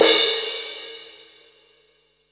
crash L; Zero-G; Chemical Beats; CRASH ZOO

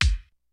KICK SNARE; Kid Nepro; Elite; KICKS SNARES

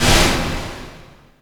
Kick Rev, dry kicks; Quasimidi Rave-o-lution 309

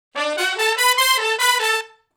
Shuffle Blues Riff (D) unison; Sections

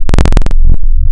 Classic Sine, wavetables; Architecture 32

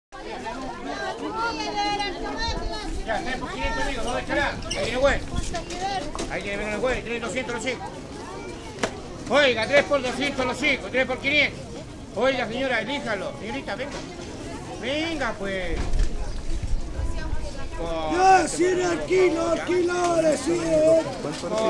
Salsa Picante; Masterbits; World Zone VOL-3

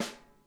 SNARE, Drum Kits

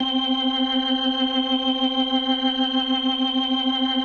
C4F, B3, Vintage Keyboards

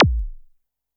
korg trident

